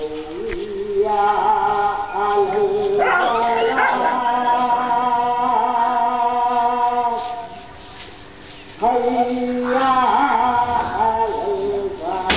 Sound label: Islamic Chant Enumulupalli